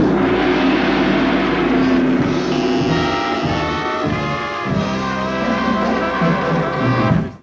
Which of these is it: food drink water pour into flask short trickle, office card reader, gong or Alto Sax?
gong